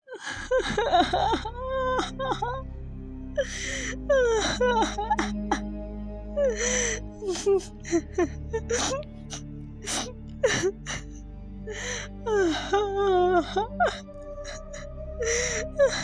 scary crying girl sound effects

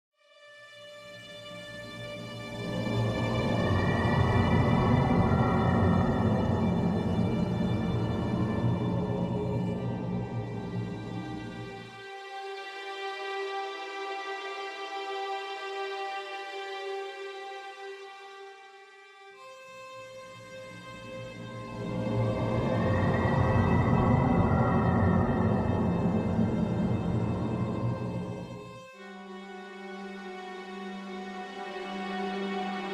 dark creepy horror music

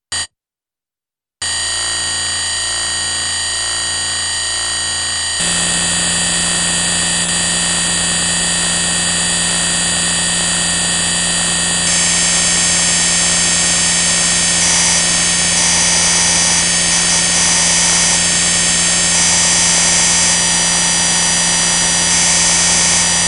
noise

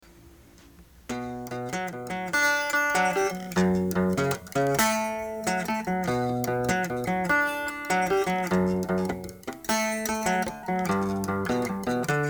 spread triads bm

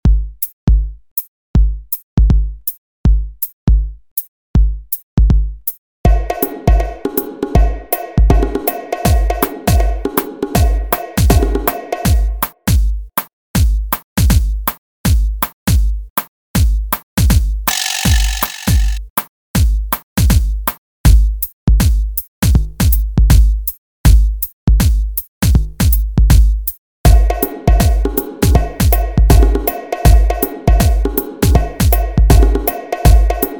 Hiphop Reggae Bass Power

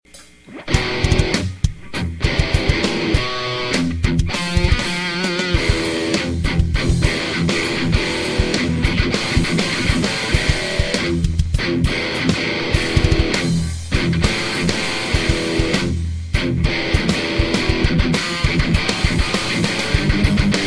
metalzao

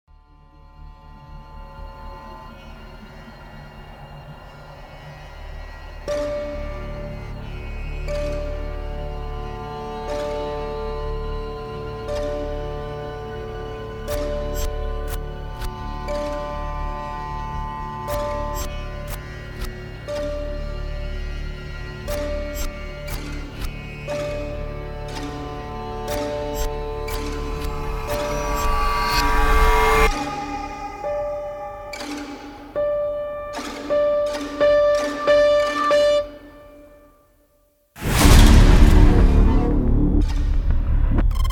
trailer music dark creepy horror sound design

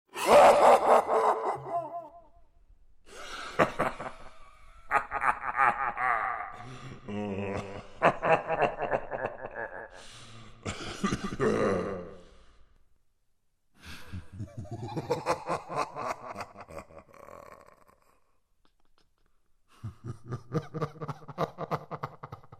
scary evil man laughing insanely horror sound

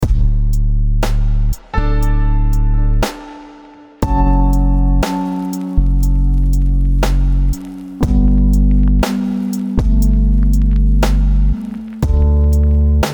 lofi

